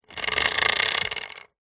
industrial chain small pull over thin wood hoist medieval short fast